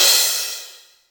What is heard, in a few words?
long room reverb cymbal sample f key